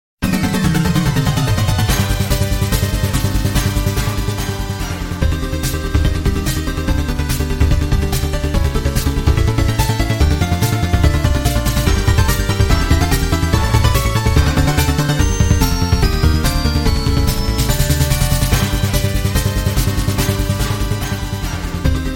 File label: Chaos Boss fight